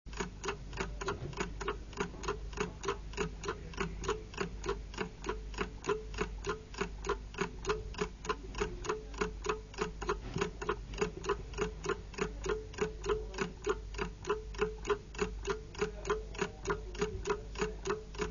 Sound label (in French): temp reveil ancien